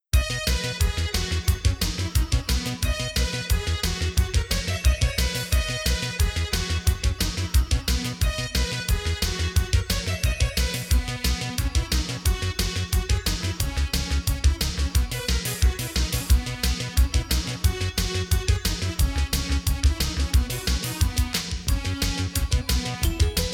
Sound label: CanCan; Lemmings; PC-Engine